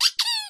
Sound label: squeak; Barotrauma; Neurotrauma